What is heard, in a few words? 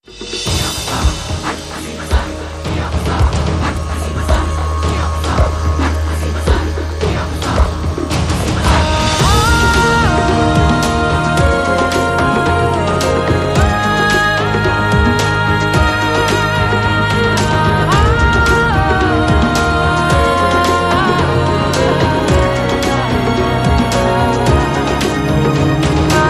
No Percussion